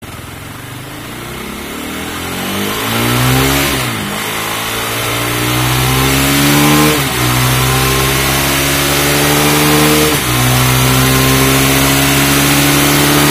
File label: sound, LeoVince Enduro Sport Slip-On DRZ400 KLX400